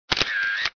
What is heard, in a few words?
camera